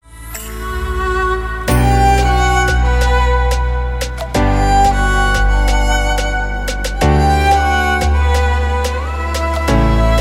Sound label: Violinist